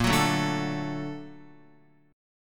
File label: Bb Augmented Major 7th down Guitar; Bb+M7 strummed; JGuitar